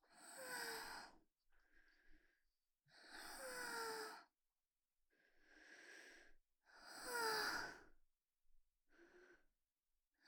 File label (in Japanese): 吐息